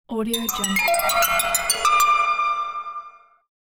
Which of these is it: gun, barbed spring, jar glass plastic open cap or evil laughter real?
barbed spring